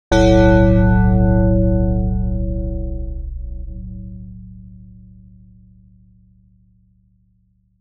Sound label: bell; TS Sounds; Impact; falling rooms